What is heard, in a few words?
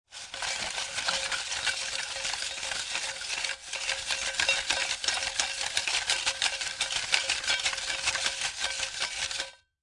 Paper Spinning In Metal Pot Ticket Cage, Lottery drum; Gambling